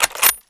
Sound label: awp clip out, weapons; csgo; ro2 k98; css